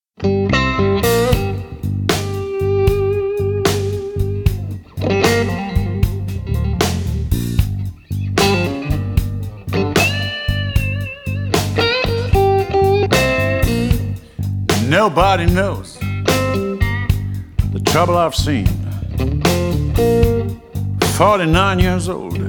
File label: Blues